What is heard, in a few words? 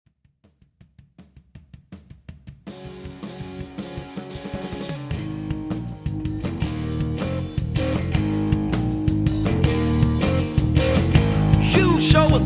rock